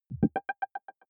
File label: button echo falling; maschinen; signale; soundpool